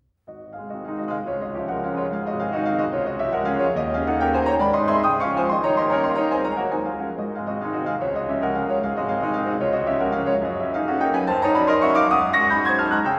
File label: Etudes for piano, D flat major; Chopin; The Complete Études; Chandos